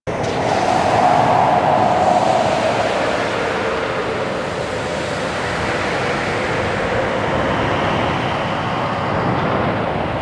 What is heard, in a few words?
Shipyards Crevice Amb; Ambience; Exterior; Warframe; Grineer Shipyards